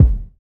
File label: kick sound E key, loudest frequency: 107Hz; kick drum samples; drum single hits one shots